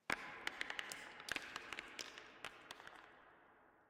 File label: crumble, Mines; AMB; OneShot Fading Memory